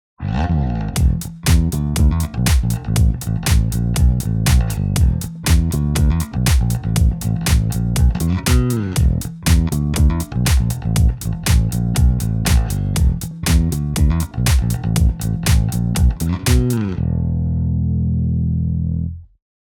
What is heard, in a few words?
bass comparing real fing